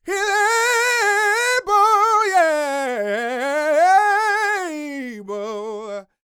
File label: Vocal Gospel; Vocal Planet Vol1 Gospel